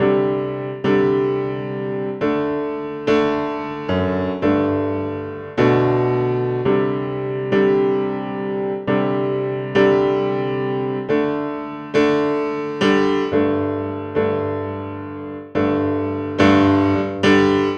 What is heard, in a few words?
Country Piano Chorus 2; Digidesign; Pro Tools; Pro Tools Essential Demos; Essential Loop Demos; Demo Session - Country